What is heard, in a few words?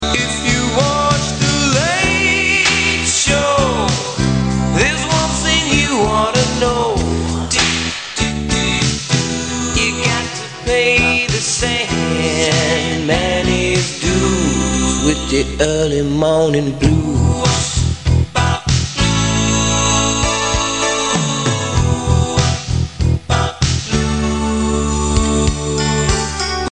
early morning blues